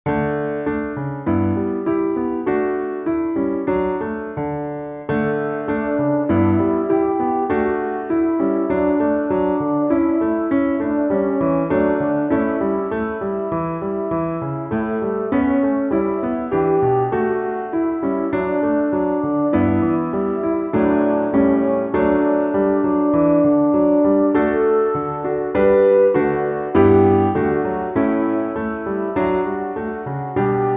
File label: instrumental